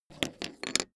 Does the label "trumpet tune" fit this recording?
No